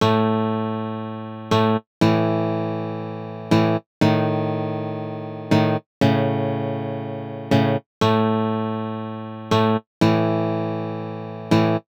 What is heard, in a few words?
Lydian noodlin